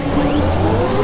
displacer spinup; XDM

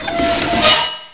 lever; XDM; buttons

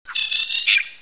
bird; XDM; ambience

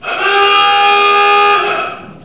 alarm; XDM; ambience

